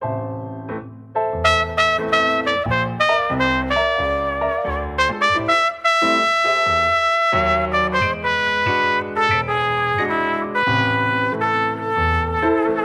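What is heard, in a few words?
Jazz trio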